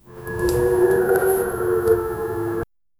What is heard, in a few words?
make a walking sound of